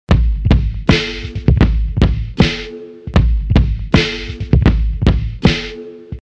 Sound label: attack loop; ATTACK PACK